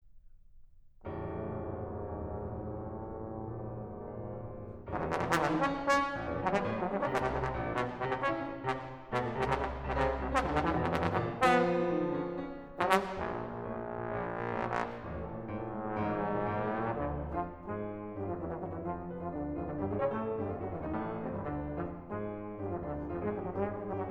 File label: Sonata for Trombone and Piano Blues